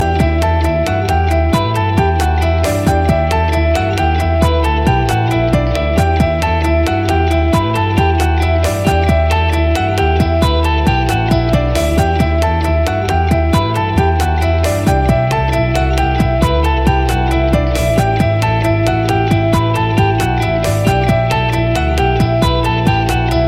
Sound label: Mobile Ringtone; Death Note